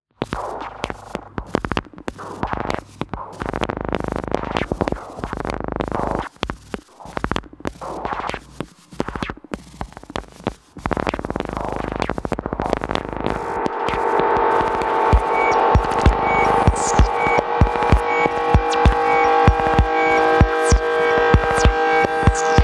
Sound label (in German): Frau erblickt gelangweiltes Pferd